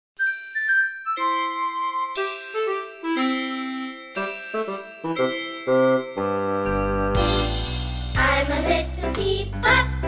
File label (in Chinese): I am a Little Teapot, 英文儿歌